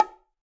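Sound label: Bongo High Open; Bongo B H Open 1F; Latin Percussion; Drums; Drum Hits; Ableton; Factory Packs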